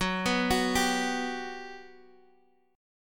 G Major 7th, Gb

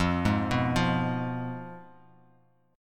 F Diminished F down Bass Standard; Fdim arpeggiated; JGuitar

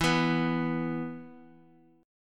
E 5th E down; strummed; JGuitar

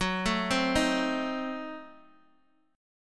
Gb Diminished 7th, down, Guitar, Open D

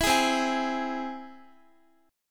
C Augmented down Guitar; strummed

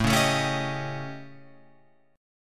A 7th Flat 5th A, Guitar Open F; strummed

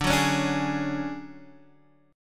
D Major Sharp 11th D Guitar Standard; strummed; JGuitar

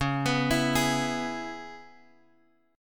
Db Minor 7th Db down Guitar Drop D

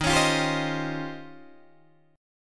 Eb 13th, Guitar, Open D

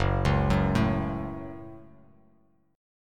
Gb Minor Gb Bass Standard; arpeggiated; JGuitar

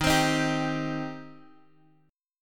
D sharp 6th, D sharp, down, Guitar, Drop D

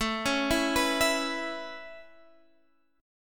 A Major Add 9th A Guitar Standard, chord; Aadd9 arpeggiated; JGuitar

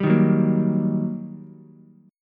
G Minor Major 7th Sharp 5th, down, Ukulele; GmM7#5 strummed; JGuitar

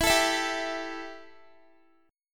E Minor Double Flat 5th E Guitar; Embb5; strummed

